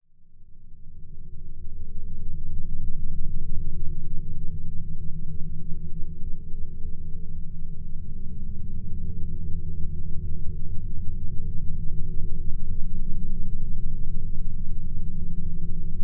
science fiction spacecrasft control room atmosphere drone subtle futuristic